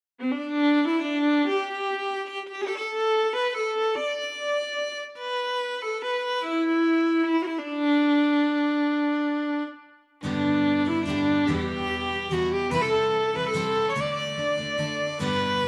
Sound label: Sea To The Skye Traditional Orchestrated, celtic fiddle; red room audio; traveler series; kontakt